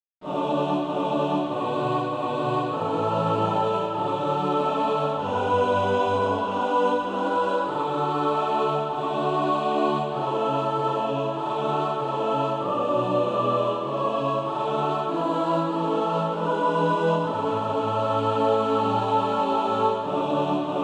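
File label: mixed, SATB Practice Music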